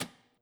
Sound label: switch; simutrans; Pak128. Britain-Ex